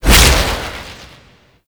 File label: Infested Hard Drive Cudgel, melee attack; Warframe; Infested Lich